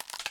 Paw twitch; Phasmophobia

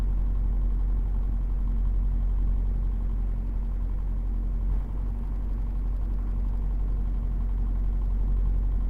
Electric fan spin; Phasmophobia

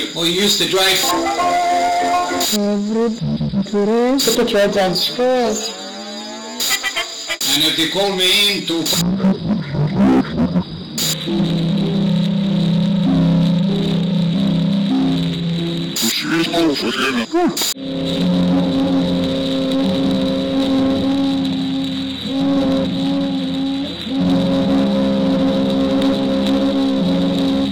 Creepy radio montage; Phasmophobia

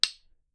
metal small; Phasmophobia

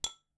glass; Phasmophobia

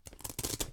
Velcro close; Phasmophobia

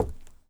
FOOTSTEP Trainers Wood Boards Walk mono

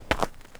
footstep trainers snow compact walk fast; Phasmophobia

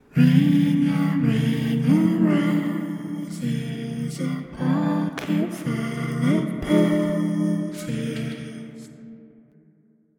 Female Singing; Phasmophobia

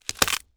BREAK Snap Crack stereo; Phasmophobia

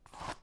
Voodoo Pin; Phasmophobia

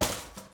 Lantern drop; Phasmophobia

